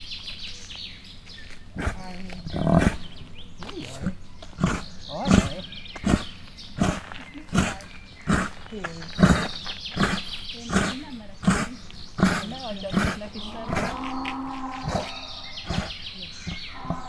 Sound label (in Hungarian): balra kulan jobbra egypupu teve, renszarvas; miskolci zoo 2018